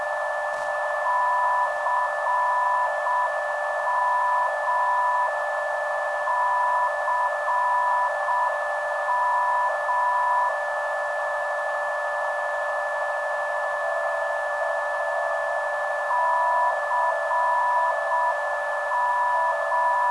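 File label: non-directional beacon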